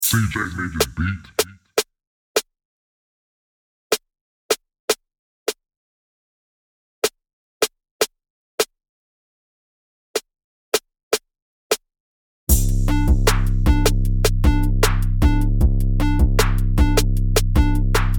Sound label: 808 Beat